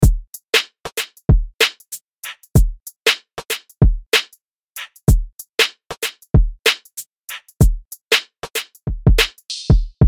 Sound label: Dancehall Afrobeats 95BPM